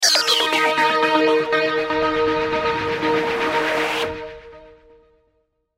Logo Sweeper Strings Pan; Sonic Logo; Blastwave

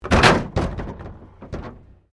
Wreck Door Panel Clunk Metal; Wrecked Cars, impacts, crashes, scrapes; Objects

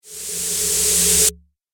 Trailer Riser White Noise; Rise And Dive

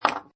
Pinball Sound Ball Drops; Video Games

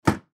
Soda Vending Cooler Large Antique Close Door; Machinery; Industry; Blastwave

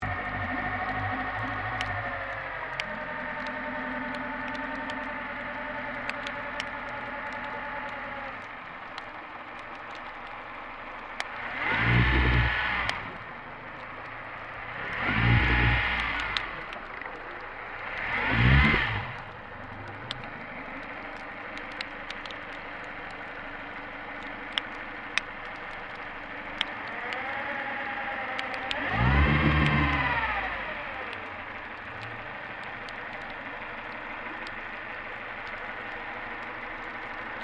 MAINTAINENCE WORK UNDERWATER BOAT MANEOUVERING; Maintainence divers off StKilda Pier; Construction

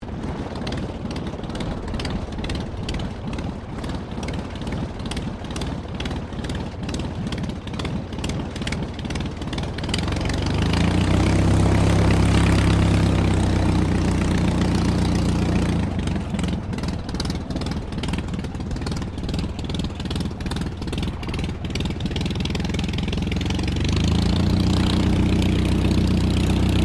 AIRCRAFT DH82A DEHAVILAND TIGERMOTH TAXI; RAAF Tigermoth; Airplanes (Sound Effects:Vehicles)

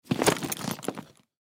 Briefcase Pick Up, Briefcase Pick Up Leather Bag; Foley

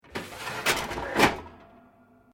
GRILLER GAS TRAY CLOSE, Portable gas cooker; Paloma; Appliances (Sound Effects:Household)

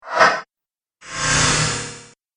Whoosh Duo Pack; Whoosh Sound Effects; Andrew Fleming

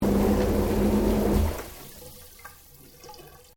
Water Bath Spa Bubbling Shutdown; Domestic water movement; Nature

